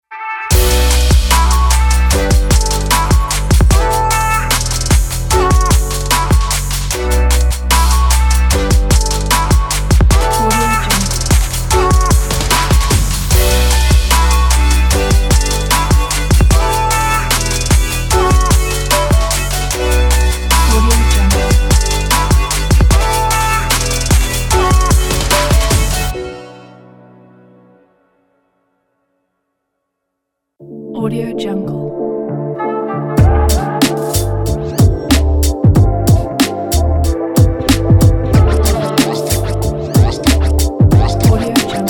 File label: hip hop ident pack